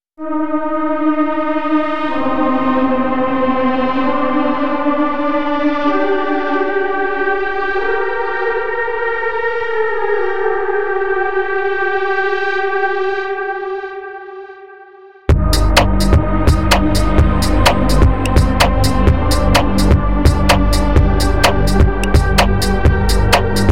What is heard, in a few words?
padshop